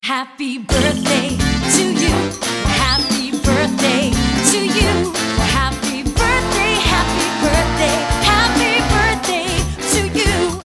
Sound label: SATB